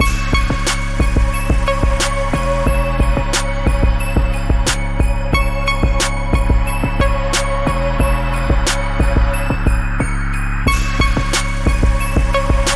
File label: genres hiphop